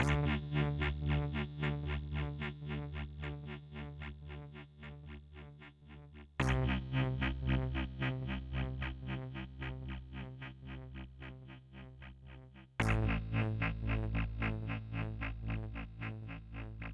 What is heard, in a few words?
Synth, 075 Dmin